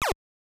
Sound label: bullet; metroid